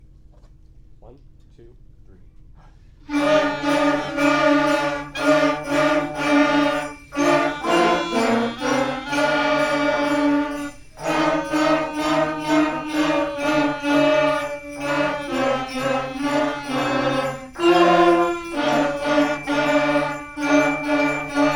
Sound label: jingle bells, grade 5, winter concert